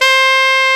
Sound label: SAX A. MF C, Alto Short; Roland LCDP07 Super Sax